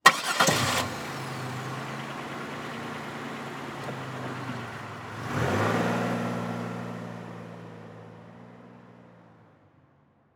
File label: Transportation Car Monte Carlo Start Drive Off Quickly